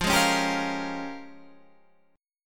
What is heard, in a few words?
Bb Minor 13th F